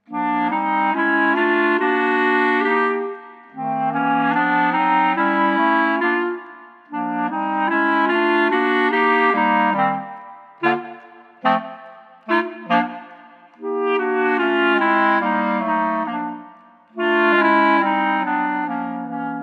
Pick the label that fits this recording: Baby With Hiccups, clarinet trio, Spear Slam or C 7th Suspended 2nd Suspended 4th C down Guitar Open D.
clarinet trio